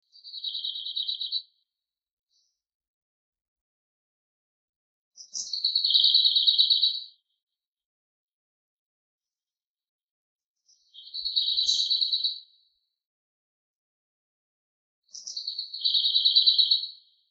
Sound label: bird